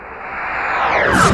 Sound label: Skill Silent Arrow; FlyForInsanity